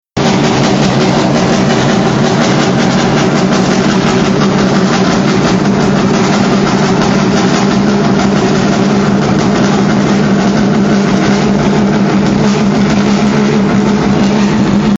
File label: ferrari throttle